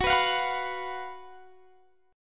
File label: F sharp Major 7th Suspended 4th, Guitar Standard; F#M7sus4; strummed; x,21,21,22,19,19; down; capo=3